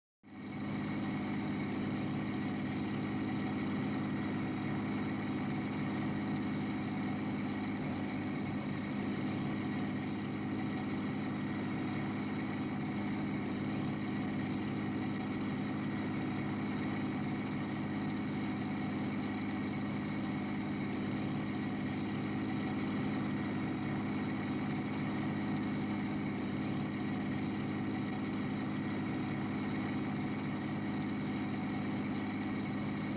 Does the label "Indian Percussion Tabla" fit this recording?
No